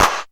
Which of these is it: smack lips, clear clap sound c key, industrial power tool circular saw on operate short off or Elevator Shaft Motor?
clear clap sound c key